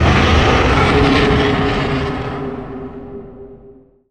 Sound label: arctic burn; winter wyvern